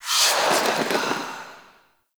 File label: Dash Stinger; Warframe; Dante